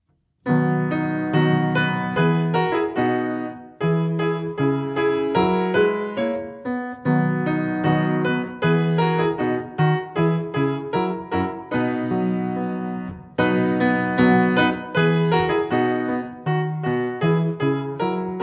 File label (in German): Tiger Song Playback; TigerKids